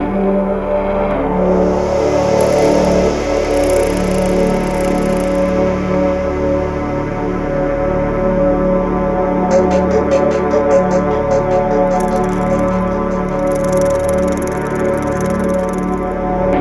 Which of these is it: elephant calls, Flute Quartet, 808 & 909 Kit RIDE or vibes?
vibes